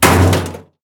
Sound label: metal sheet impact hard